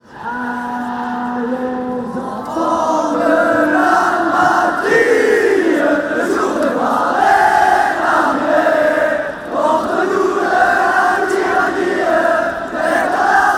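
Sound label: french national anthem tune fanchants; french national antham tune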